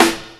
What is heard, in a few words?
Misc Snare; Mo' Phatt; Digital Sound Factory Mo' Phatt